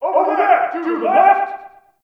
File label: Ambient Campsite Yells; Warframe; Tau